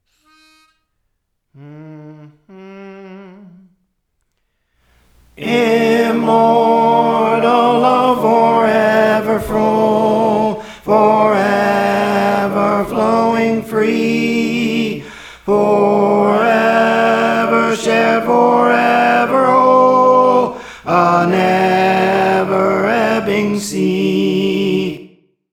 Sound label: Tenor; Praise For The Lord Training